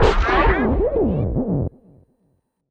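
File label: deep house FX, 125 bpm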